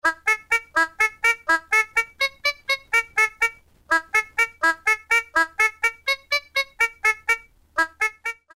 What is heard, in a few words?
Jungle Oboe Riff, Roland MC-303